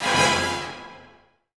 Combo Stab

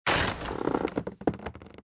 heavy wood crate crash

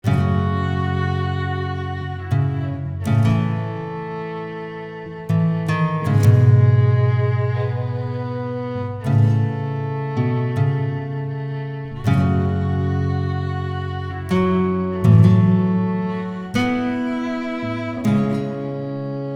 Stinger Calm

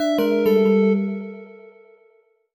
jingle chime negative

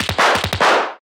Miniturbo Ok

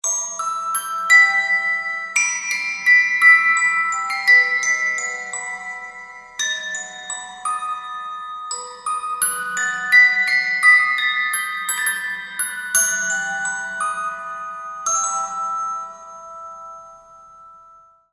Orgel; Klaipeda; tree of savior

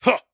attack; Elder Hu

